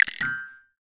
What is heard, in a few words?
landmine triggered